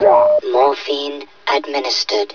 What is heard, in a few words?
pain; sven; player; helmet